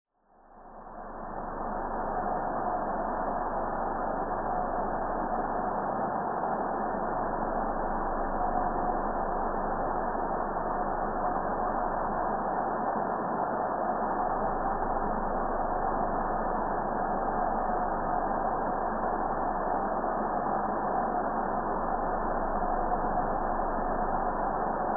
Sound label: science fiction spacecraft interior roomtone airy; zapsplat